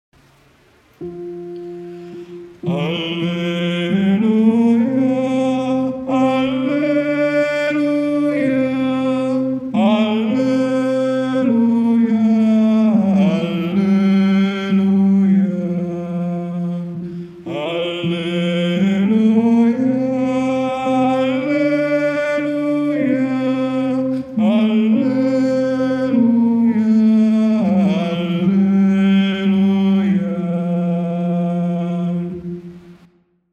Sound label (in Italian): Alleluia Soprani; Lourdes 2017